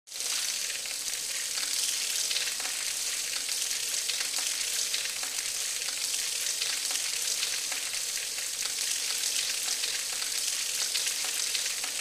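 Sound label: Distant Water Dripping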